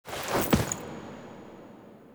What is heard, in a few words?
Drifter Foley B; Warframe; Quests; The New War; Sealab; Hunhows Lair; Hunhows Lair Part One